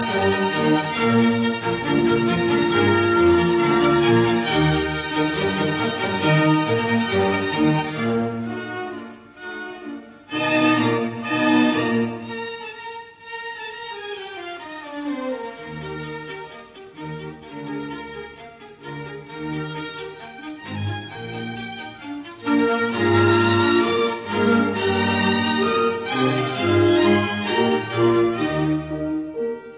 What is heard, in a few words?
Piano Concerto in E flat major Allegro; Mozart; Jeunehomme